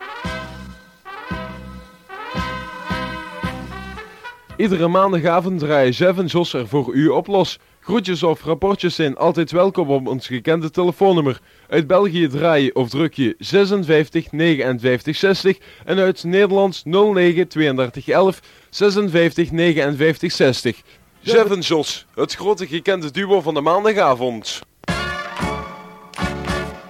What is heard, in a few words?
Jingle; Jef en Jos Maandagavond; Allerhande